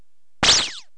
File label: lazer